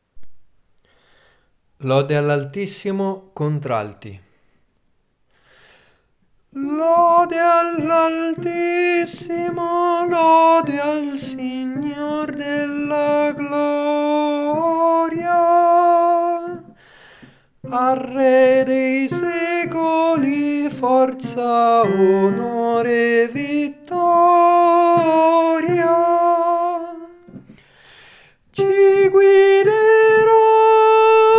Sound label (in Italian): lode all'altissimo contralti